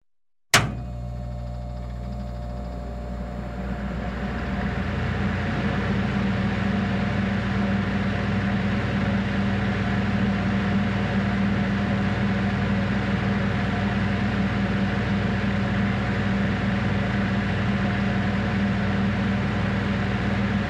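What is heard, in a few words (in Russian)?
звук вентилятора пуск работа стоп средняя скорость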